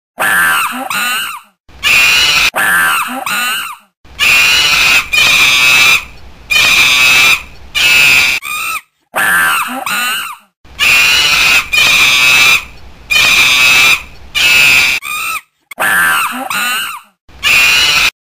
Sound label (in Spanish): Monos Sonidos